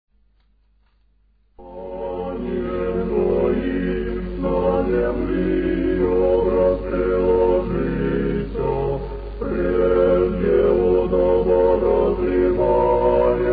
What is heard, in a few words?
irmos; octoechos